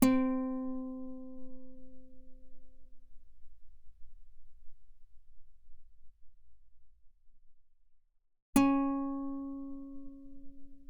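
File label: Guitar fortissimo sul G C4 B4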